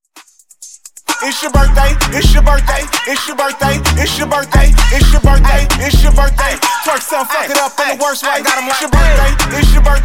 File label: Hype Intro Outro 130bpm